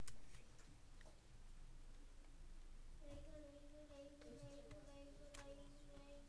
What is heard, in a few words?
sea